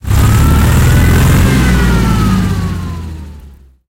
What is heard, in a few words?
hetzer engine load, tanks; War Thunder